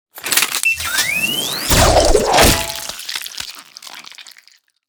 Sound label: Decapitating Shot; Maugetar; Warhammer 40000; Tabletop; munitorum Volume I Sound Effects